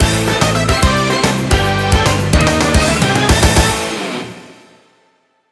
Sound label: Game Over, Music; TMNT